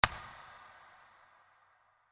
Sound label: pick Axe; Dynamite Digger Eureka